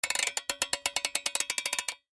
Steampunk Gear Lever, Scifi, Steampunk, Gear, Lever; Science, Fiction; Steampunk Sound Effects; BlastWave